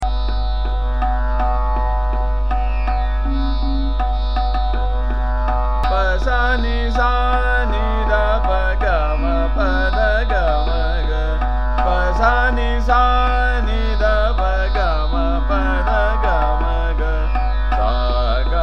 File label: Sargam P S' N S' n D P, Trital; Khamaj